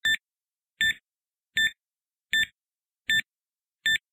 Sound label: beeps; lifebeat